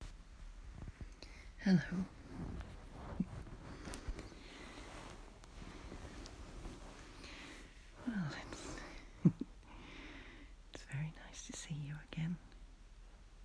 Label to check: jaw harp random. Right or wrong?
Wrong